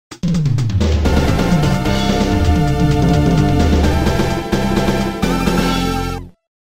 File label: stage clear